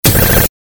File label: Galery Noise; Sound Ideas - Galery Noise 001